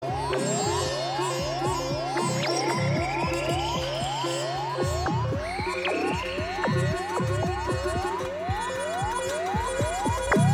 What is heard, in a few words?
Beat